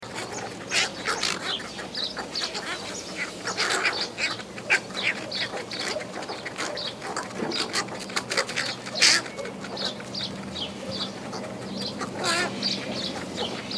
Kohager

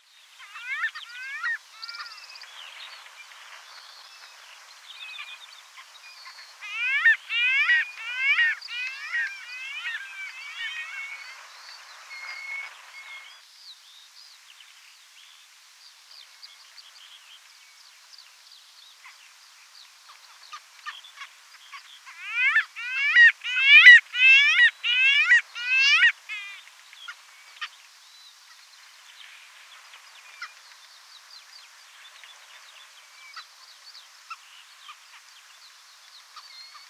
Kustlabb

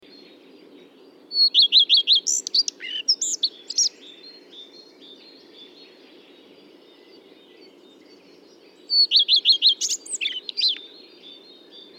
Rodstjart

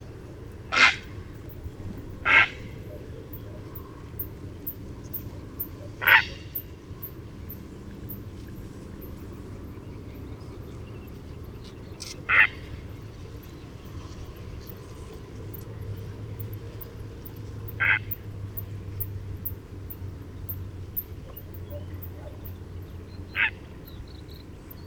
Purpurhager

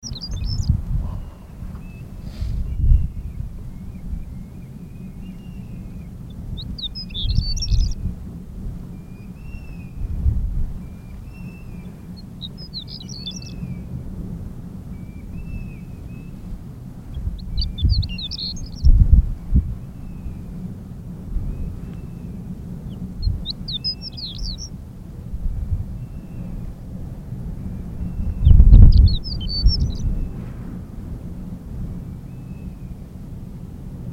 Berglarka

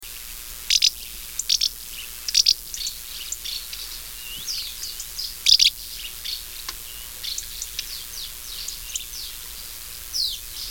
Hussvala

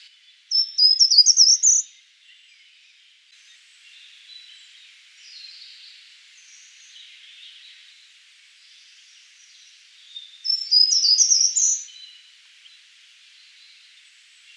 Tradgarstradkrypare

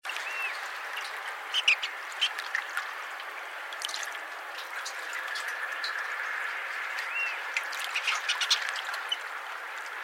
Skarsnappa

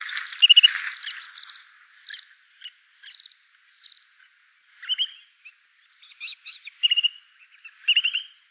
Tereksnappa

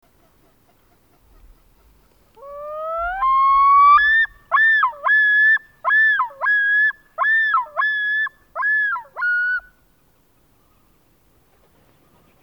Svartnabbad islom

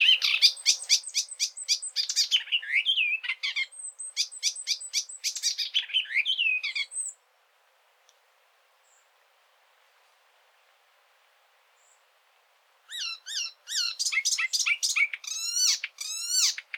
Harmsangare

